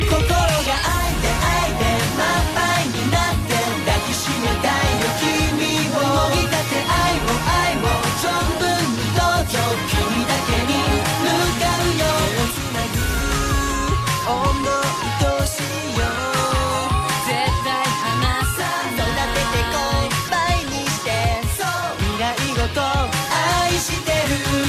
Chorus

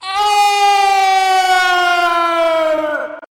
Fighting Yell; Sans

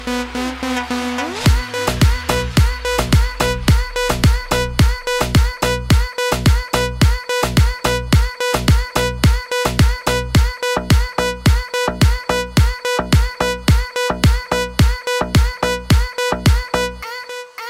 Arabic Music

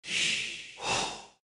Villager Created; AOE

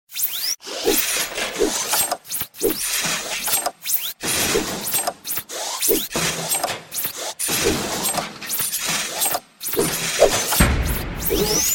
Robot Robot

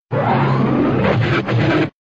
Ion Cannon

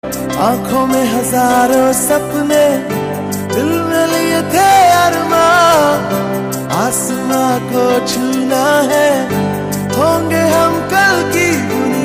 Hindi

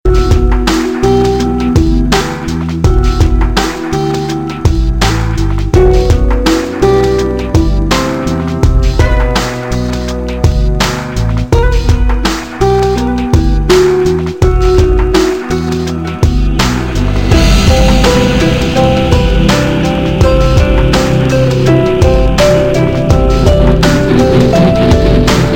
Music; Dhobi Ghat

